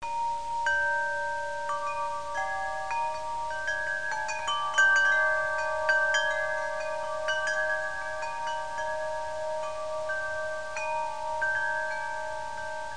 Ambient; xRes 2.0 SE

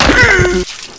big impact

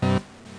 PIM PIANO; Groovy Bytes: Behind the Moon; ALLSTARS

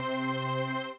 string

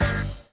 noise

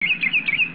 bird

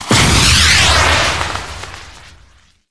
Helicopter Missile Fire; Red Shark

